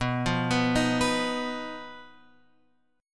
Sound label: B Major Flat 5th B, chord; BMb5 Chord {7 8 9 8 X 7} arpeggiated